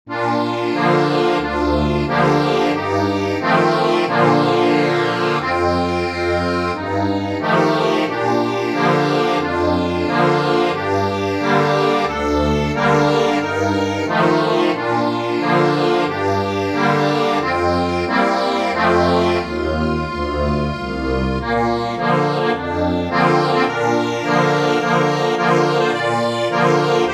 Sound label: ACCORDION